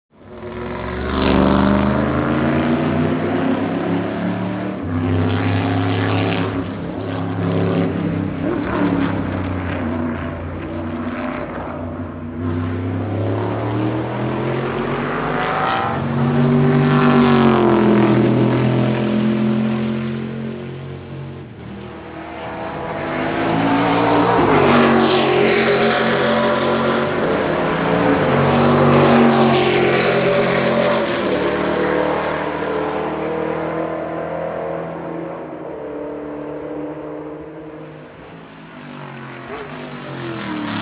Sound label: Car sounds Corvette C5R; Le Mans; Le Mans Les Hunaudières